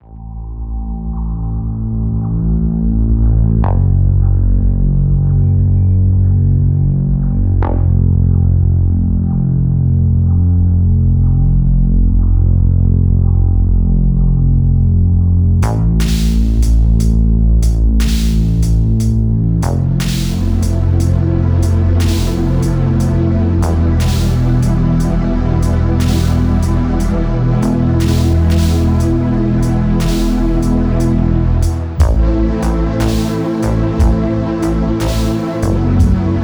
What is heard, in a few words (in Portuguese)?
com ruido inicio; Vagrant Fury